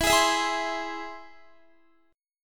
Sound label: E Augmented, Guitar; strummed; x,19,20,21,20,20